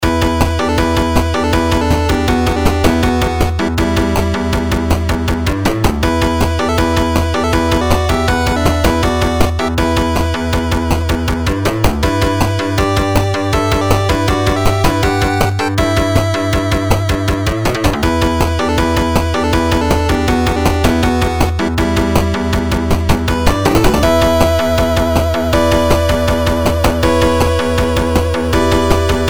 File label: game music